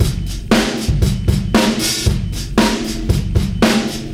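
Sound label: fx, Beat Loops, 116 bpm; Zero-G; Monster Beats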